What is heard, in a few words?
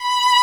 violin